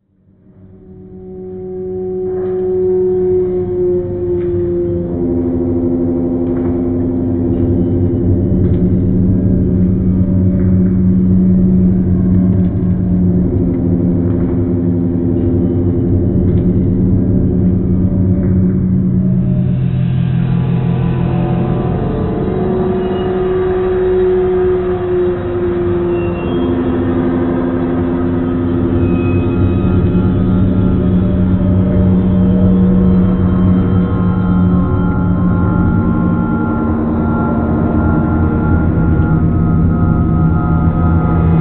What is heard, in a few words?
ambience